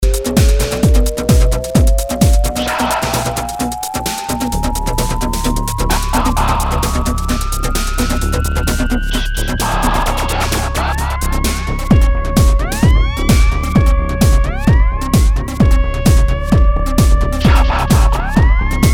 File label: VCO Synth